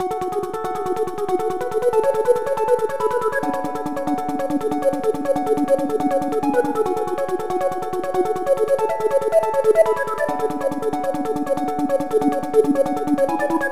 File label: Arp Loop 140 BPM F# Min, Future Bass, Synths